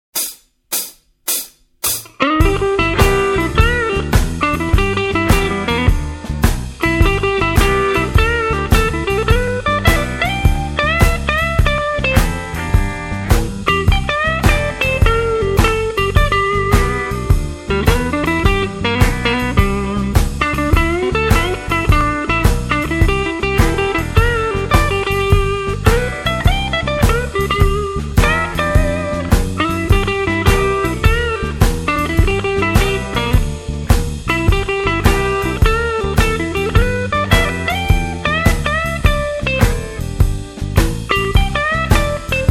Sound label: blues jazz